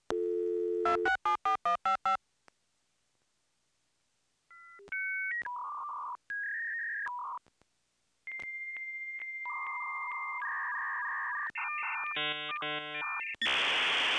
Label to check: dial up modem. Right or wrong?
Right